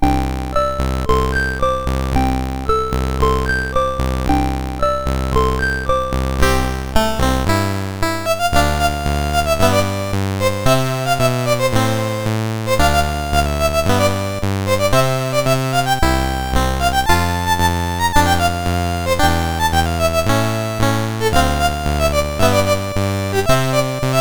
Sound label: Christmas California, MOS6581R2